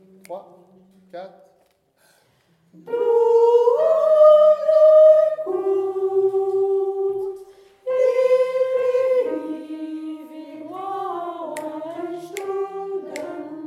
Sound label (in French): Soprano